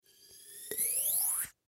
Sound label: Air Pump Pull Lever Up; Air Pumps; Machines; Blastwave; Foley